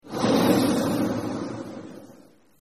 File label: Oven Burns; Delicious Emilys Childhood Memories